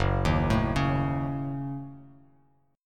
Gb Minor 6th, Bass Standard; arpeggiated; JGuitar

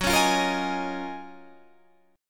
F sharp Major 13th Guitar DADGAD

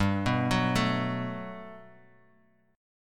G Major 9th, Guitar, Open G; down

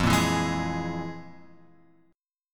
F Minor Major 7th Double Flat 5th; FmM7bb5 Chord {1 1 2 1 X 4} strummed

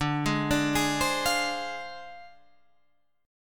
D Minor 7th Flat 5th Guitar Half Step Down

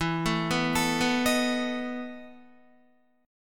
E Major 7th Suspended 4th, Guitar; arpeggiated; JGuitar

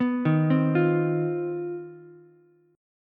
C Minor Major 11th C down Ukulele C6; CmM11 arpeggiated; JGuitar

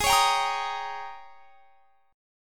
Ab Major 7th Suspended 2nd Suspended 4th Ab, down; AbM7sus2sus4 strummed

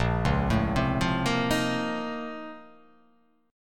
A sharp Minor Major 11th, down

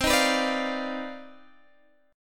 C Minor Major 11th C down Guitar Half Step Down

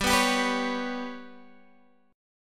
G Major Flat 5th; GMb5 strummed

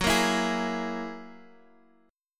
F sharp 9th, Guitar, Half Step Down

